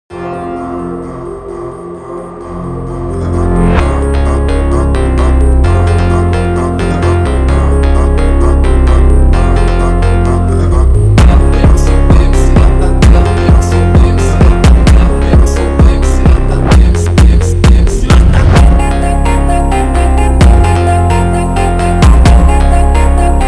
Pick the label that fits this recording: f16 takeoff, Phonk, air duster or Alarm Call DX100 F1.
Phonk